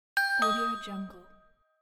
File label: notification